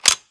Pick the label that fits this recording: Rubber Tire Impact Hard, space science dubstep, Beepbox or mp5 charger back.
mp5 charger back